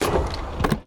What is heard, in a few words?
open wood box